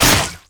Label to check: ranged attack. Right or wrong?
Right